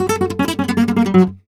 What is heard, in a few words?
f#, Flamenco Guitar Picado; Picado 1